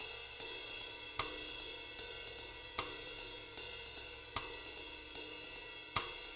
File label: SWING, Living Drums